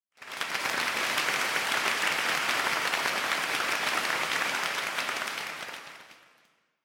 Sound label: Applause Mid